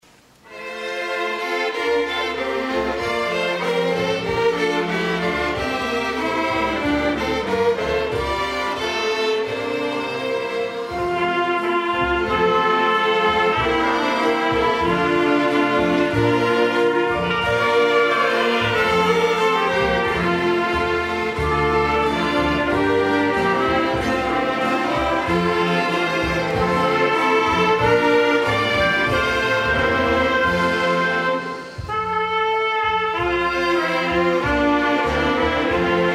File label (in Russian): Оркестр; Церковь ЕХБ Спасение Edgewood WA